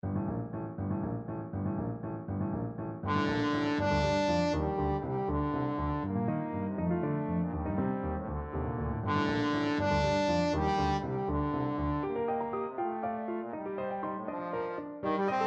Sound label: bruckner sym7 scherzo, trombone